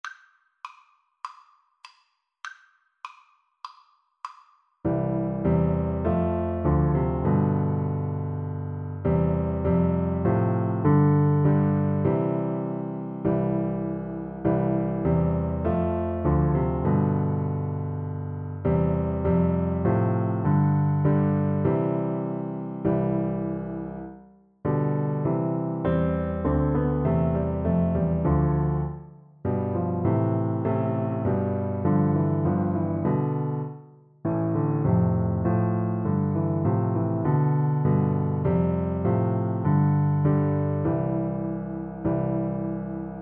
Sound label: ding dong, Piano Duet